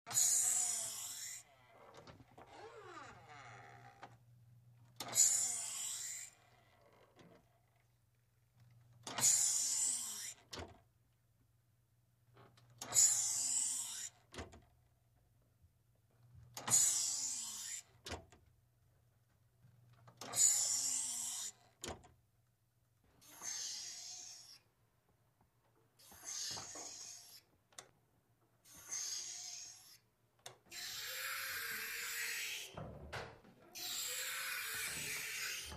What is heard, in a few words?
Pneumatic Door Hinge